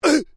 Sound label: MALE VOCALIZATION UGH; Exclamations; Voice